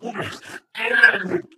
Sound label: ballbot drowning